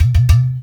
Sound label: UDU; Spectrasonics; Heart of Africa